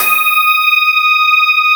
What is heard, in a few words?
sparkles